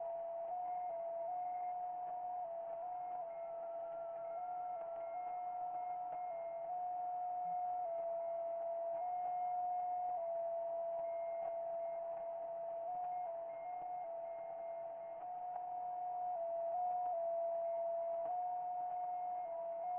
qrss audio

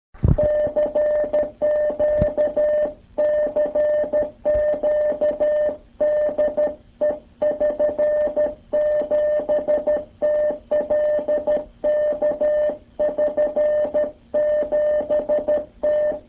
morse; ylradio

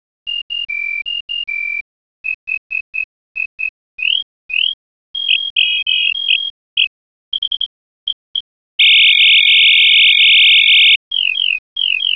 hell tune

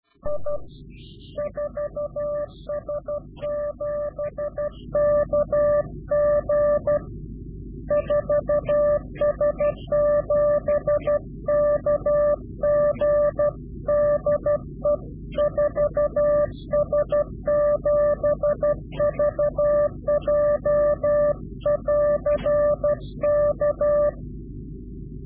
Directional QSO, Morse Lessons, CW; Directional Call

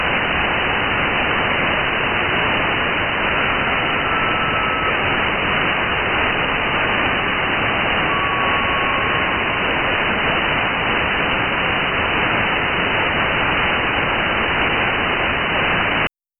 ISS echo GRAVES

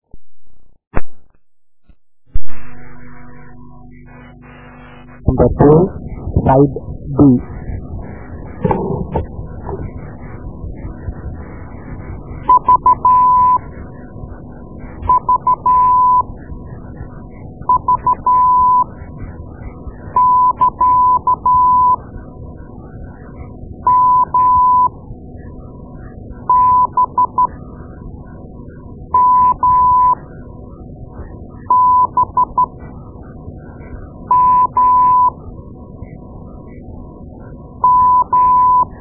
Morse Lessons

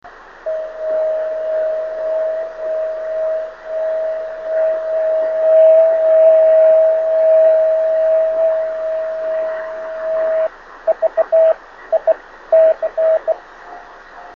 26920KHz

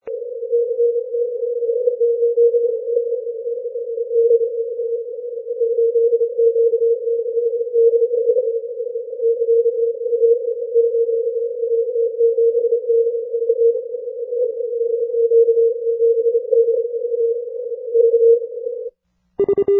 80m cw; Maldives on 80m cw; 8Q7DV; FT-1000d

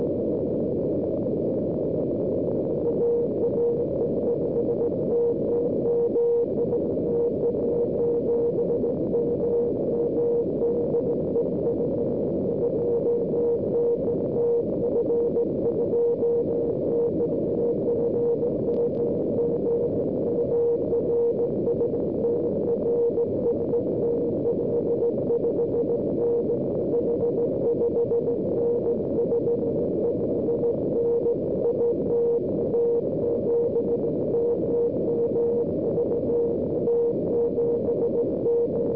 CW; Project Moonbounce

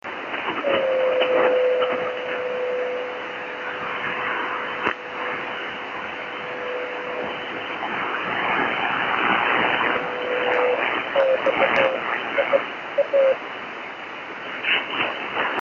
26695KHz

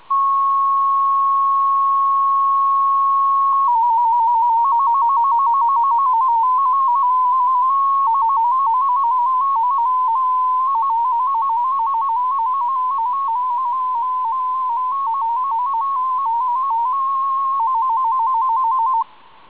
coq8

